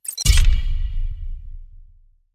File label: Quest Open